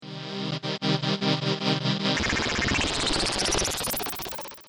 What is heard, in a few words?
RING MODULATOR; Creamware Pulsar 2